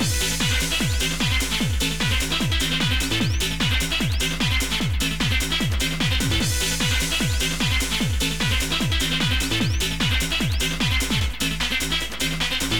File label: loop